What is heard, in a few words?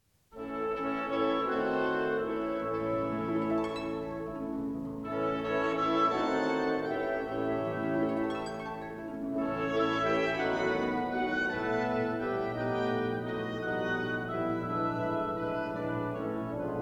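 harp orchestra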